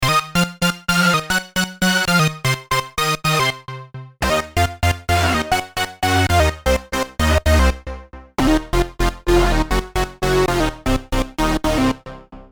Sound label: TransEcho, Prophet '08